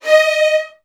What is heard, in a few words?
D#2, Strings, VN 16TH; ILIO; Kirk Hunter